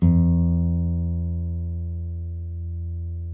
NYL NV F#, nylon no vib guitars; Spectrasonics; Hans Zimmer Guitars VOL-1

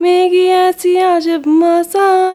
GIRL, GIRLVOICE, Arabian Traditions

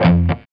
F#3 SOLDANO ROCK, Guitars; Spectrasonics; Hans Zimmer Guitars VOL-1

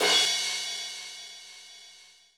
CRASH1; Greytsounds; Scott Peers Sound Engineering

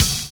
DOUBLE BD; Univers Sons

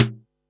snaredrum, Pearl SC-40

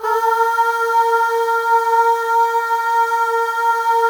Voices, FEM AHS; Spectrasonics; FEM.1 AHS

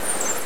Sounds of Nature; SNES; Rushing Beat Syura (Peace Keepers)

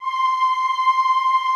OOH C5, Classical Choir; Best Service; Extended Classical Choir; OOH MIX

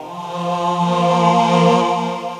Choral, Vocal; Sonic Foundry (Sony Creative Software); Myths of Technology

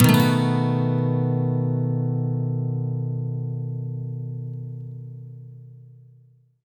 MAJ SWEEP B, Electric & Acoustic Guitar; USB Soundscan vol.04; AKAI

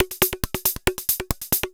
percussion loops, Techno; PS-45 WAV Techno ID

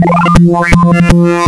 PPG wavetables; waveedit256

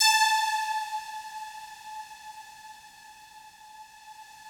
FOGATK1 A4, Yamaha VP-1; Music Store; 90's Sample CDs; FOGATTACK 1

